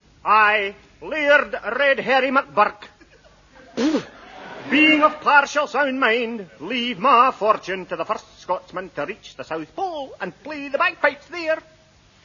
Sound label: bagpipes